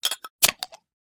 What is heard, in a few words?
open beer; Original TK Sounds